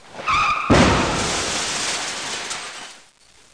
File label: Car Skid & Crash; SoundEdit 16 1.0J